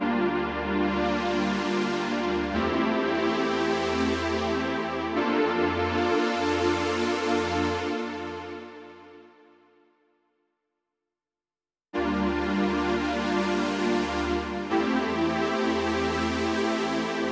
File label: pad opening 24 then 12 lowpass; Clavia Nord Wave